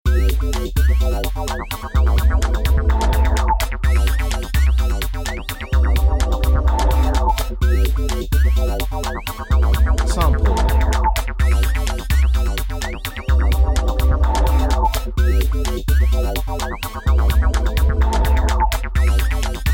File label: electro, backing